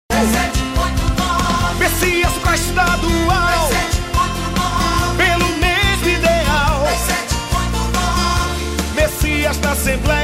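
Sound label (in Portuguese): toque celular forró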